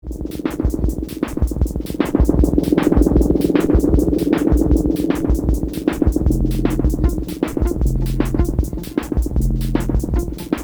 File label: Korg drums into tape echo and overdubs; jamuary 2023